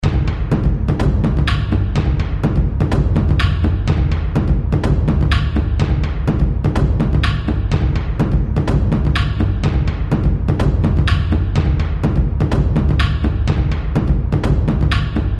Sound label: taiko